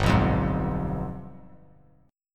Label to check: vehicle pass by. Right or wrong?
Wrong